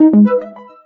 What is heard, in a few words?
menu hit